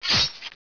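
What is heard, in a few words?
SWORD HIT; Caesar 2; DOS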